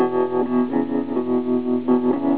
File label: guitar